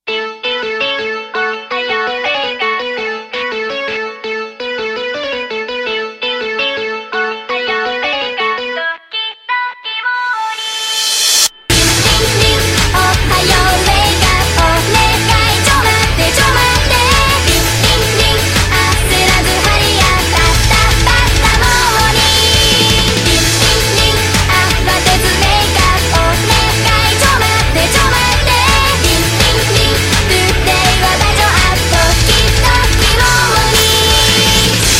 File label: RINGTONE